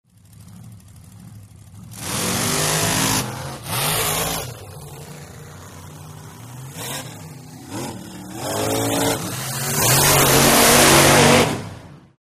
Monster Trucks Revving, Race By Thru The Obstacle Course, Shot Cu From The Obstacle Course; Revving By ( Several ) Revving By ( 2 Trucks )